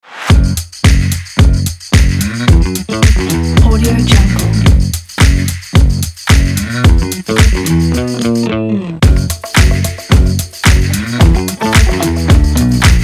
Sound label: upbeat funk